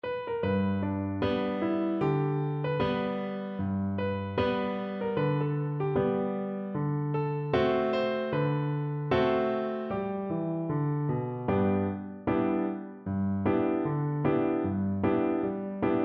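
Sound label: recorder karaoke; down by the riverside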